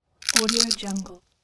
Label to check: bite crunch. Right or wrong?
Right